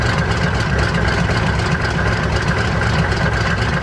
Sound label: idle, vehicles cars; lwcars